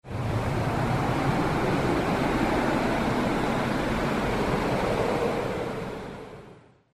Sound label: wind; Dystopia; silo